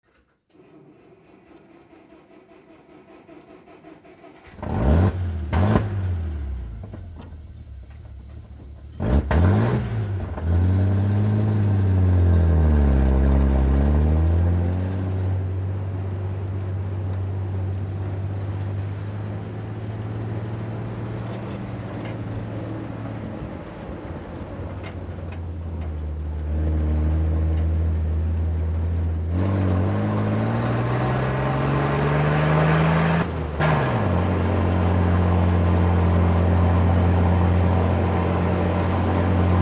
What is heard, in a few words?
Dodge Power Wagon Fire Truck, Interior Pov, Start, Accelerate, Shift.